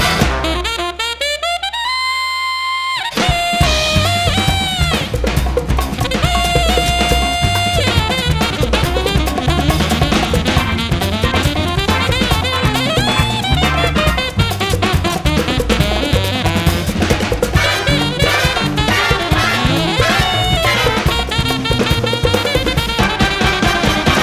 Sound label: whole solo